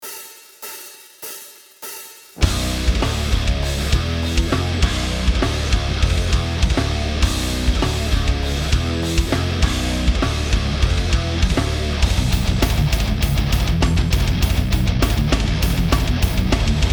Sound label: KPA High Gain; Kemper Profiling Amp